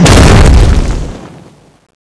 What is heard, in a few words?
attack candy; cstrike; Teddy CSO